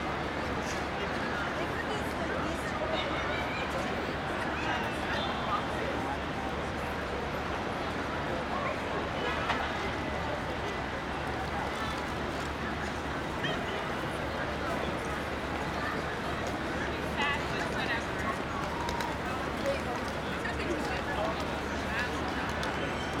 BUSY STREET commercial intersection heavy crowded street saturday evening; Times Square